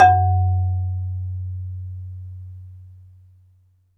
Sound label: MARIMBA; ILIO